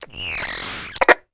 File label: Walkie Talkie Interference; Communications; 1001 Sound Effects